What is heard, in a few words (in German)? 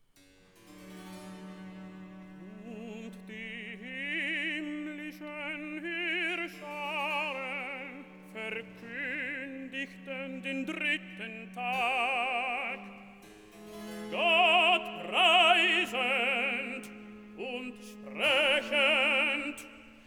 Rezitativ; Und die himmlischen Heerscharen verkündigten; Haydn; Die Schöpfung